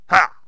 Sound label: stickfighter attack; propack